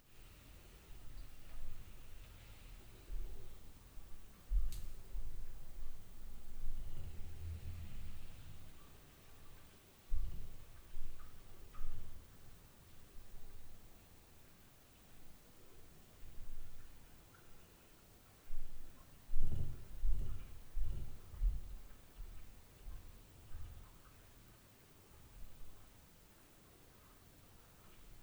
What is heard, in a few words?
LF UPSTAIR NOISE, Night Noise